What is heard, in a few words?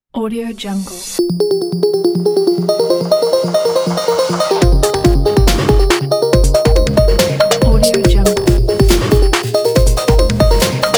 bells modern noise beat intro logo; toongsturec